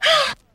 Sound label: huh female orgasm, Voice; ooh ahh; heh huh